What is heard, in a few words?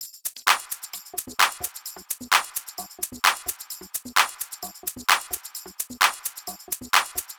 Drum Loop 130, Downtown House; Drum Loops 130bpm